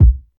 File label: dry bass drum b key, Royality free steel kick drum tuned to the B note. Loudest frequency: 82Hz